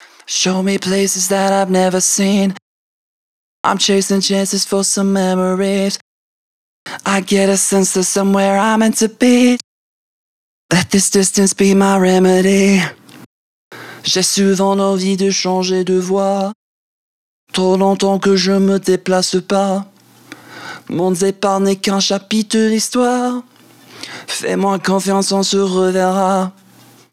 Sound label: acapella